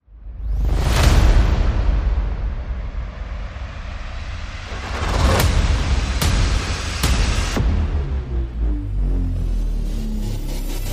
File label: Percussion and Effects